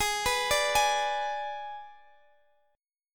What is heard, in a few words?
Ab Diminished